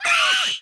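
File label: DEATH; giants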